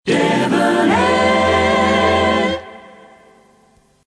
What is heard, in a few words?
short long, idents; devonair